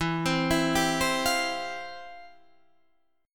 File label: F Major 7th Suspended 2nd, E, guitar